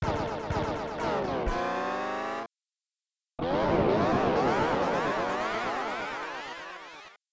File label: bowser defeated; smw